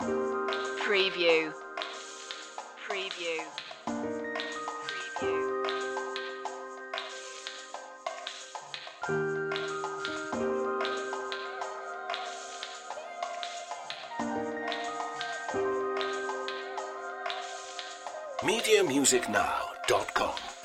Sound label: bluestone streets loop